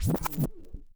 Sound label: back spin short, vinyl record